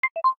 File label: Dock; Samsung; Z2